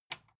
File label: metal button tiny release enable